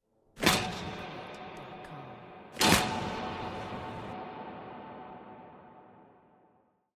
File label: designer breaker switches; SoundsCrate